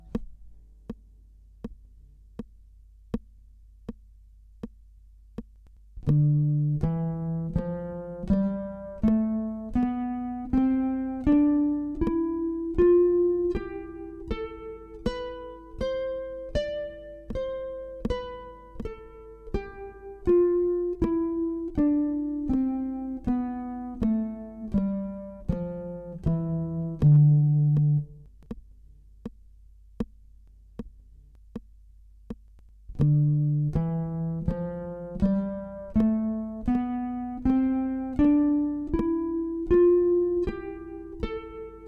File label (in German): Tonleiter Viertel dorisch, gitarre